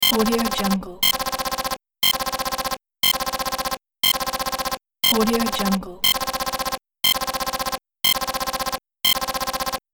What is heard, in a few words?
digital interface text readout